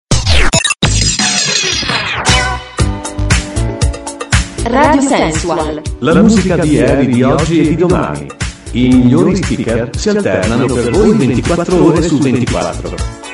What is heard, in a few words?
jingle; radiosensual